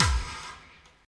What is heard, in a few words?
Percussion; Vengeance Samples